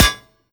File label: metal, bullet impacts; physics; Half Life Model Pack